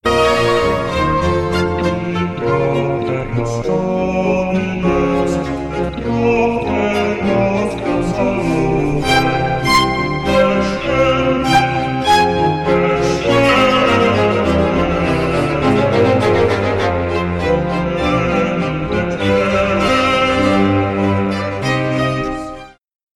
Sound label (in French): Credo basse; Stage Zelenka 2023; zelenka zwv21